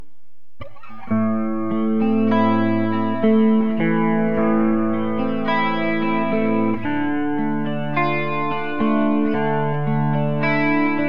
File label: neck clean; burny; fugly clips